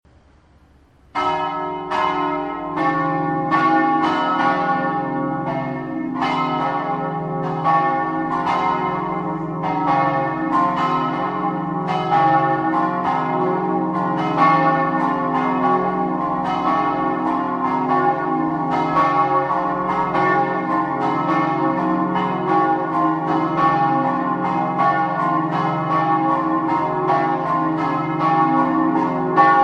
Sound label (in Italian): MUZZANA DEL TURGNANO, campane